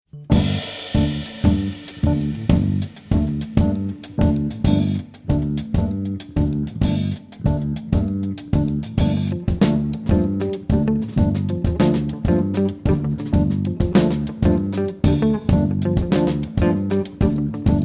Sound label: live Bossa; live in nyc